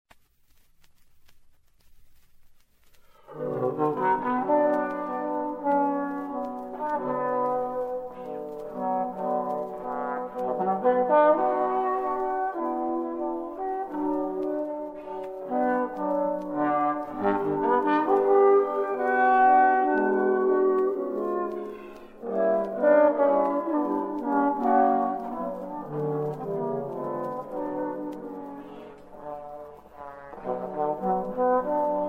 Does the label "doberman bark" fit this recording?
No